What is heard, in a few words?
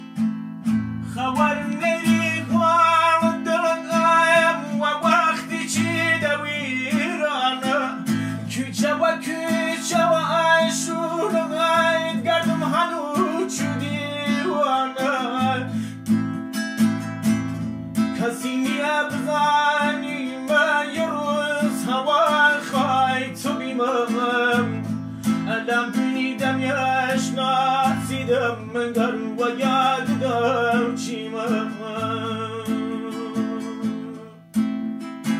kordi live voice